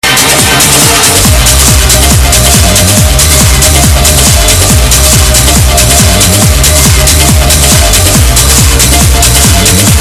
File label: techno extract